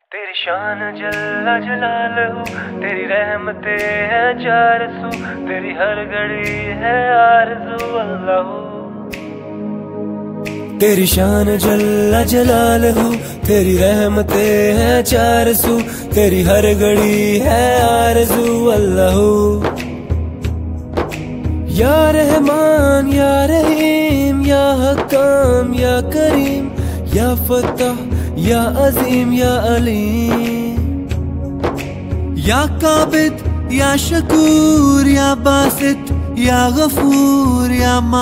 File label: islamic ringtone